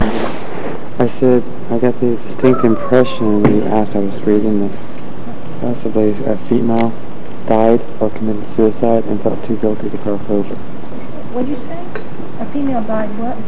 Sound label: noise thud